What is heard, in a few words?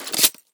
grenade in